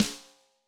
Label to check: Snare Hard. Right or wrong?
Right